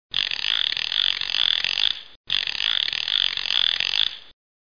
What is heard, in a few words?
Sound crank